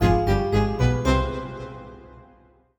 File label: Stinger; Svaior; jenny leclue detectiv